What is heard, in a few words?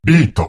beat; 假面骑士剑